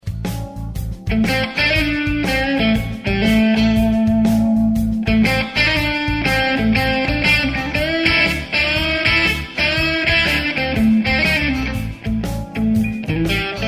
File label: Marshall Blues Od; P90; Marshall OPD1